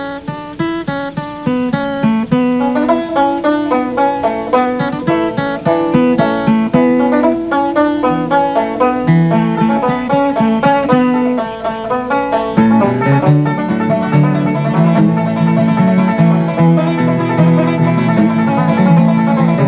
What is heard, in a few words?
banjo